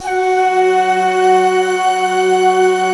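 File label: F#4, JAPAN FLUTE; Best Service; Advanced Media Trax Modern Composer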